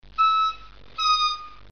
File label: bend, harmo